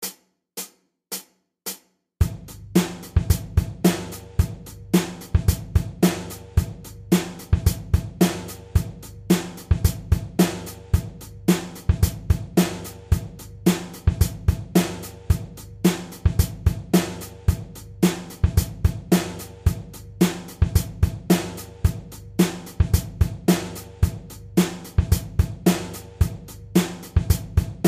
Doubling Bass Drum

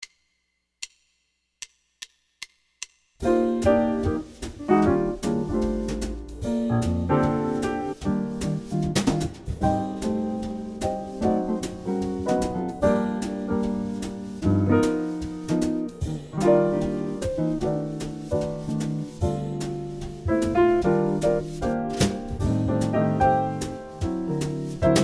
150, bass

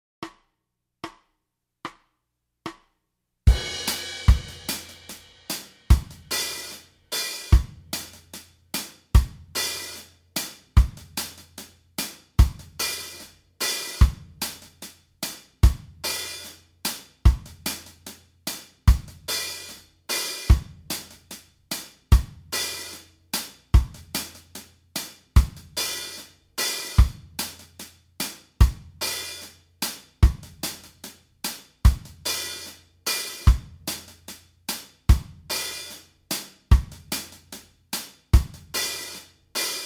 Kit

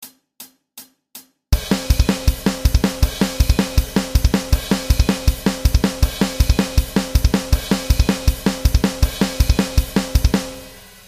thrash, bass

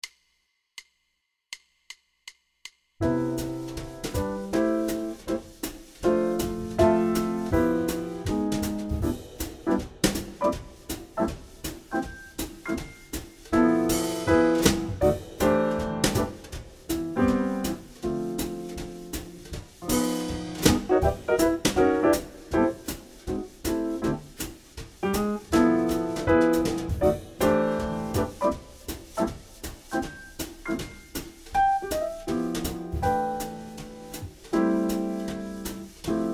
1625 in C 160bpm, bass

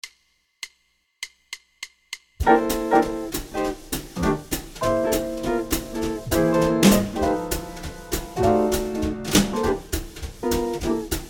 Swing Blues Basic 200bpm C

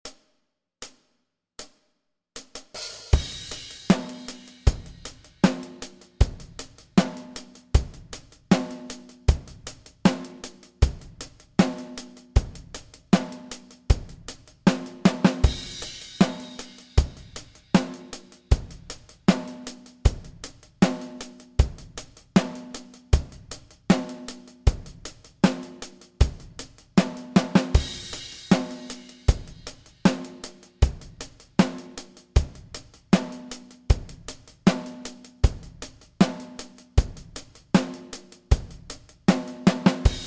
16th note reading track, bass

